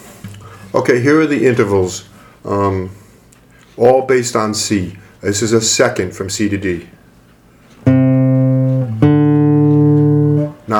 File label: intervals, classical guitar